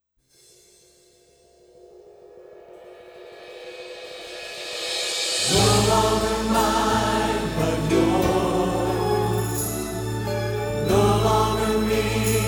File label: Chorus